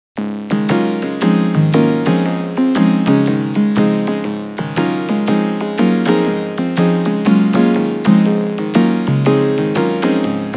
memorymoog; opxpro

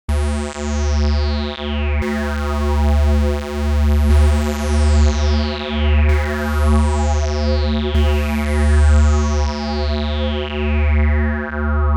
stereo sweeps; obx; vst audio; opxvst

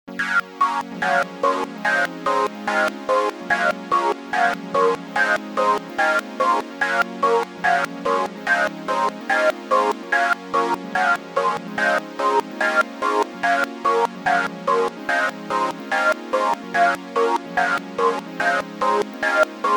square mod synthi; opxpro

